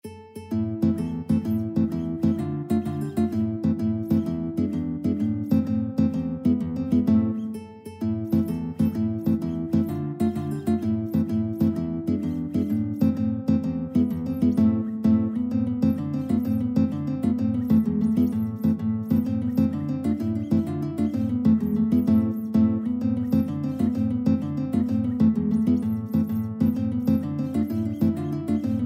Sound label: tarantella, guitar duet